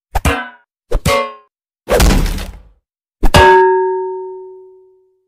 shield

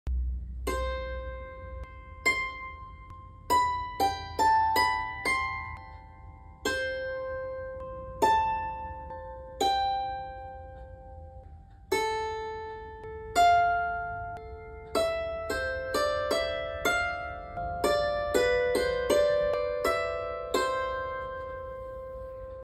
Hammered dulcimer

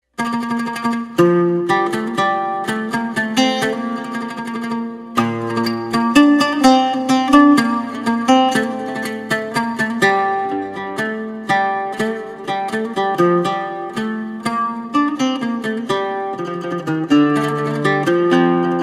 Oud; ساز عود

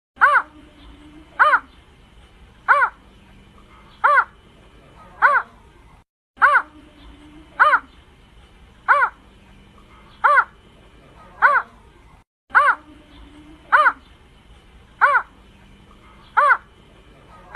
Greater Painted Snipe; پاشلک بنگالی

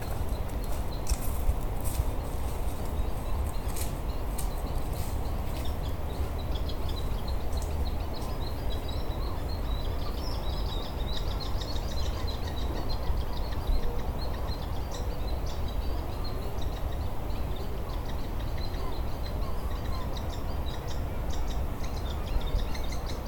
Levant sparrowhawk

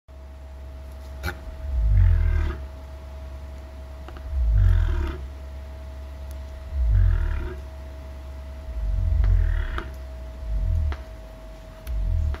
cassowaries; صدای پرنده کاسوری

